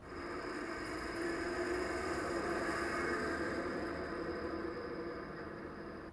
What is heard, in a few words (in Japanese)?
車交通エアトースあり